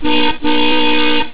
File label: car horn